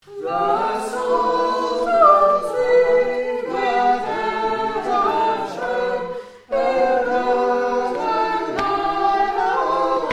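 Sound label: Soprano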